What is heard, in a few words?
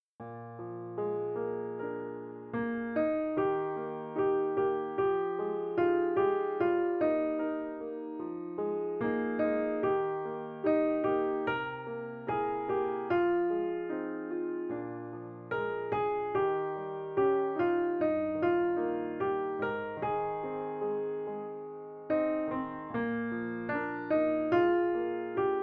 Melody